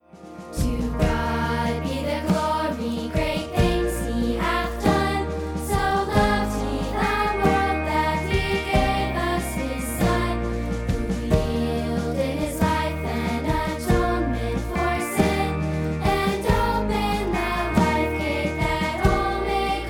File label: instruments